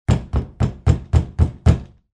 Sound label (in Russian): silnye stuka pozvanivaet shhekolda; Звук стука в дверь